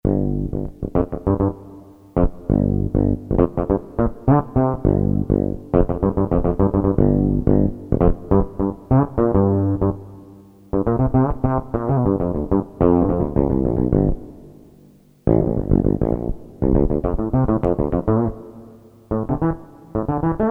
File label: Jazz Bass; Korg DSS-1